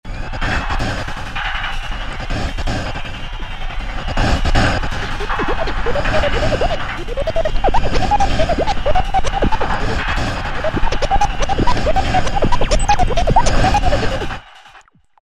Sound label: Ultrarave, Roland SR-JV80-06 Dance